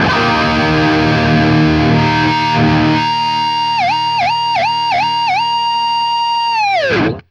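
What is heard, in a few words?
power D# cut, guitar power chord; East West; Steve Stevens Guitar Samples Collection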